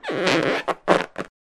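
Balloon Squeaky, Sound of a balloon being squeaky. Great plastic stretching sound effect.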